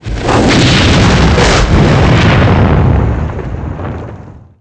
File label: explode; planecr